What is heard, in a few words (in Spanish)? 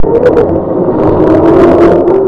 casa sintesis coche